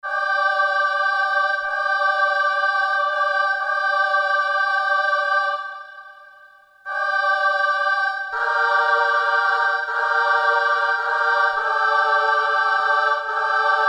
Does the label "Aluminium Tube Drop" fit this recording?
No